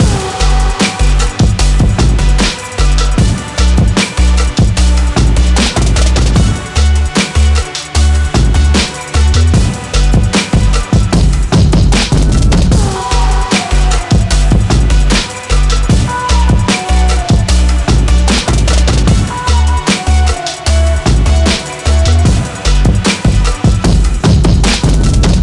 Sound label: Win; forza street